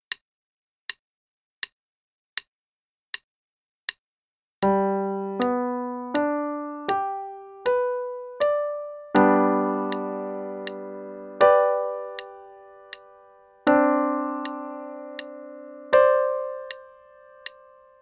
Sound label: Chords in G, qn=80; Faber and Faber; Level 1 (2nd Ed)